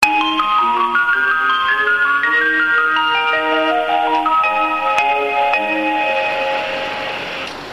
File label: bus chime; 中国ＪＲバス車内チャイム３（ひろしまめいぷる〜ぷ）